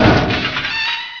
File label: door